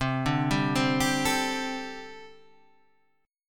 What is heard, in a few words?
C 6th Suspended 2nd C